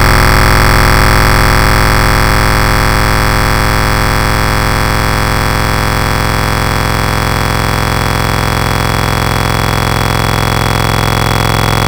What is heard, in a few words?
Silky Smooth, wavetables; Cymatics; Nightmares Vol 2 Wavetables; Serum